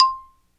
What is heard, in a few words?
XYLO, Afro Xylophn